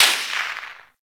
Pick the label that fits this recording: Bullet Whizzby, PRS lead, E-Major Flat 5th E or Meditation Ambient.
Bullet Whizzby